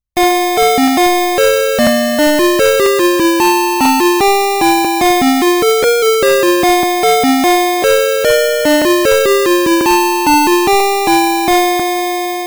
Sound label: Old Mcdonald had a farm